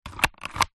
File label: foley slider plastic slide; zapsplat